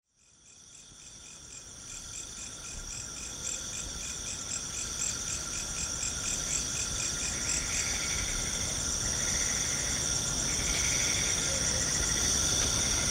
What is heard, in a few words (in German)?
ubud raya zikaden abends; indonesia